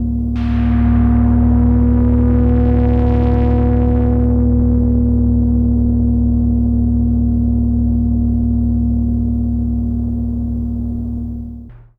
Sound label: CHORDPAD; Dune Valley; Dyssymentric